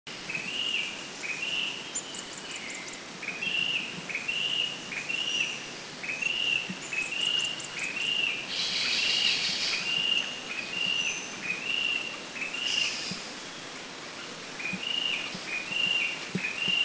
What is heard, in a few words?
Grey-backed Tailorbird, Philippines